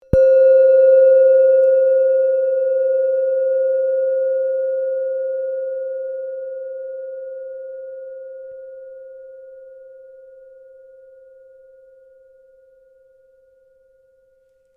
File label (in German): klangschale sonne